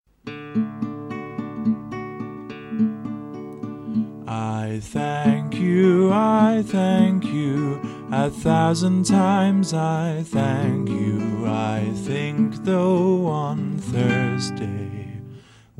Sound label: Thank You Chant (Tune of Good Morning Song); English; Songs and Chants for P.2